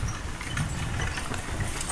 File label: Horse Drawn Wagon By; Electa Quinney